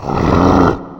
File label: mom charge up; mothershadow